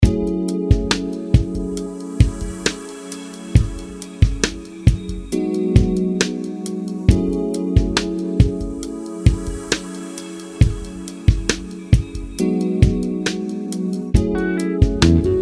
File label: sequel song construction kits